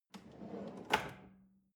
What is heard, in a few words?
drawer large trash draw out hard